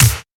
shiny steel snare drum sample a sharp key, snare one shot tuned to the A# note